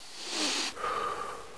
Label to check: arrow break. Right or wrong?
Wrong